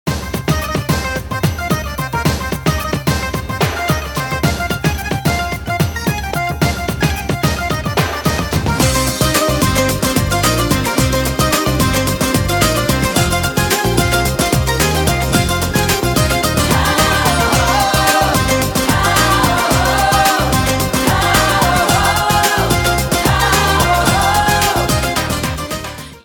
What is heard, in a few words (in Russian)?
arabskij tanec